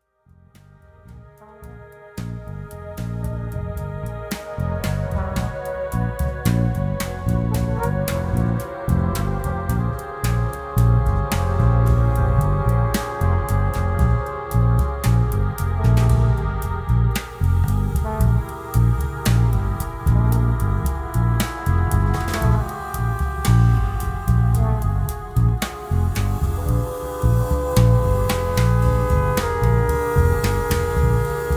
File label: Ambience Chasing